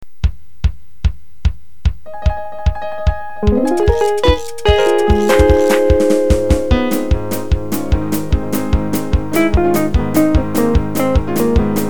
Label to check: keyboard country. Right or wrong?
Right